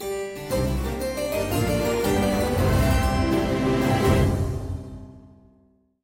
Voyage Complete; Sea of Thieves; Lost Shipment

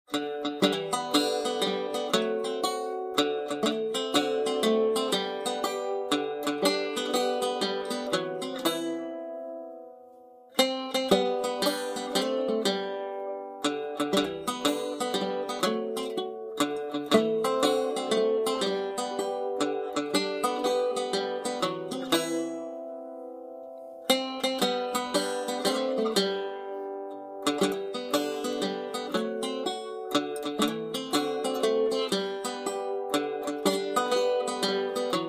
Happy Birthday Banjo; Shanty; Banjo Variant; Sea of Thieves

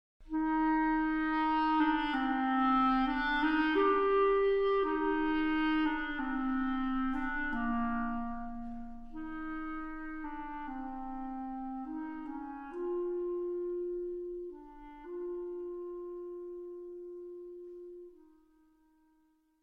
Bassoon Solo

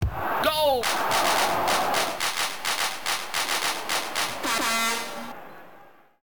Goal

